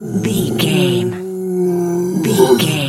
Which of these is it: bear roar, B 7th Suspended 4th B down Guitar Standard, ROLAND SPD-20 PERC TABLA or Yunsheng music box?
bear roar